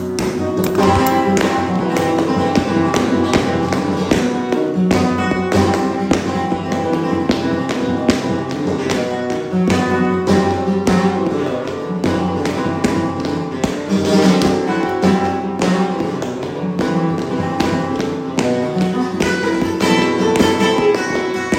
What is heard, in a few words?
falseta solea x buleria, flamenco